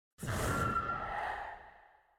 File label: zerk activate; AuroraEngine